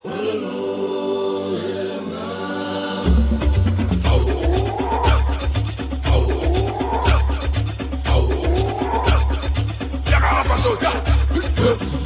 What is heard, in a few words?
djembe dance